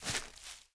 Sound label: footstep